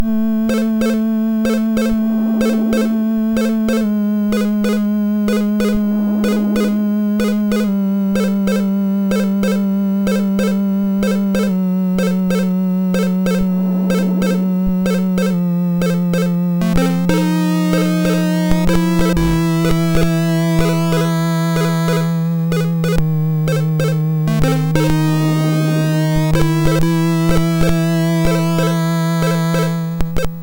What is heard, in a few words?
sid, MOS6581R2; Secret of Monkey Island